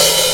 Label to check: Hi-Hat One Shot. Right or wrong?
Right